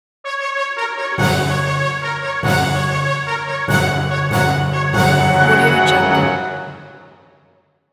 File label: royal entrance fanfare